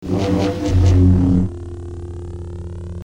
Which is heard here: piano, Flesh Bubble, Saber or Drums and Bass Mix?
Saber